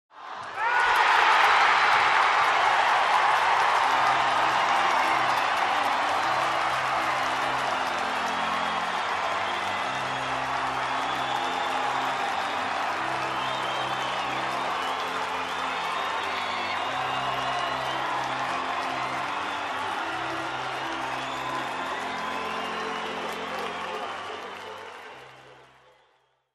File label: People cheering with music in the background